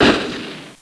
Fire; TR1; Larson

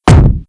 Feet; Sword God; Playable Tutorial Level

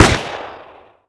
Baddy Uzi End; Playable Tutorial Level

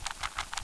Bat Flap; Playable Tutorial Level; TR1

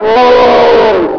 Yeti Death; Playable Tutorial Level; TR2

